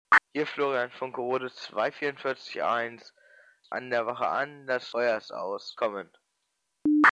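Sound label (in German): Feuerwehr Wache AN, Feuerwehrfunk; FMS; Spielhausen; Statusmeldung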